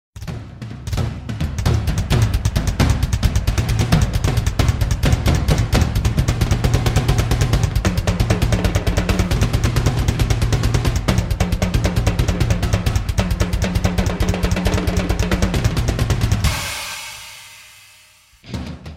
primitive rhythm machine intro

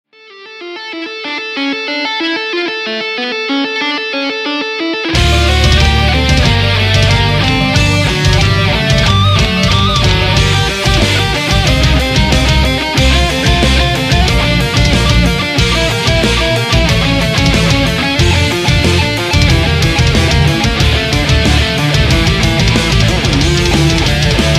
toccata and fugue in d minor

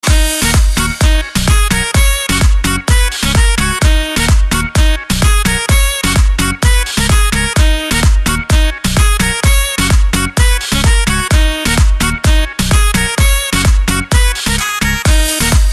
sax remix

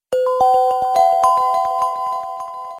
windows 8 startup; длительность 0:03

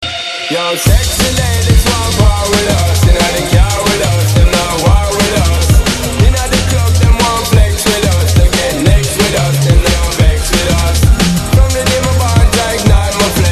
drum and bass remix

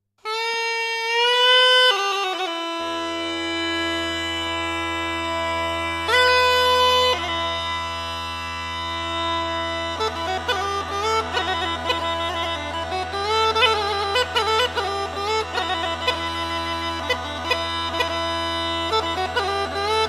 rodopska gaida

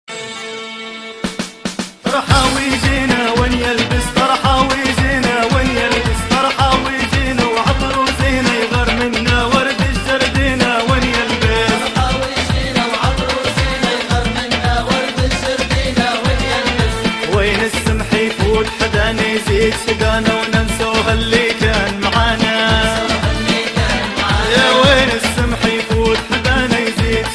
libyan arabic song

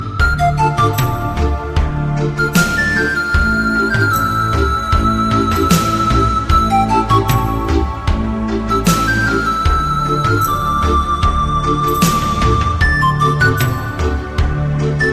culture flute

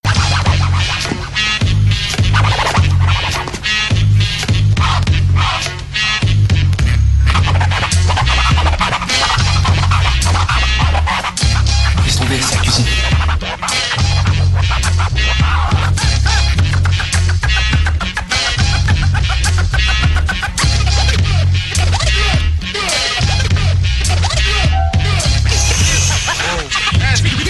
Dj Scratch; Yamakasi

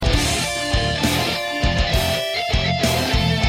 metal; legend of zelda ocarina of time song of storms

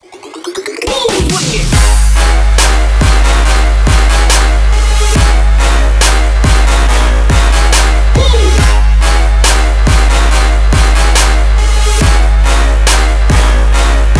trap remix

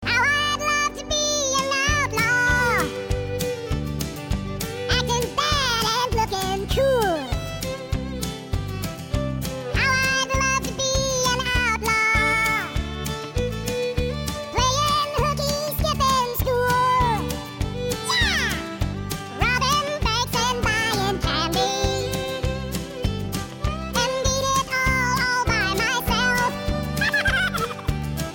chipmunks

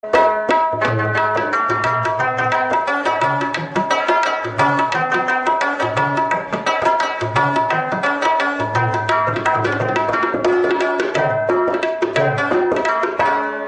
tabla mix

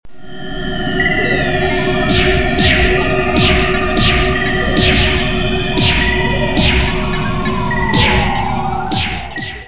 laser guns msg

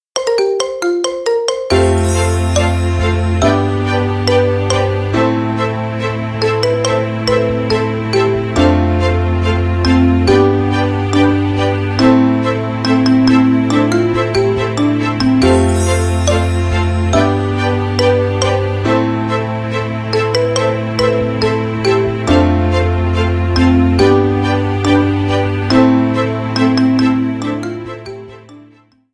marimba remix

